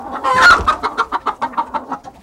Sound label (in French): poule